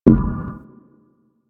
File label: Button Cancel; Warframe